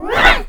shakey kid roar

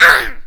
child happy roar short

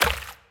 puddle

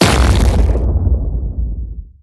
mega bean blast

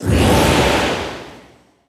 charge; haunt

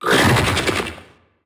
vocal; hollow

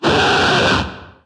chase growl; fallen

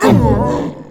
fat grunt

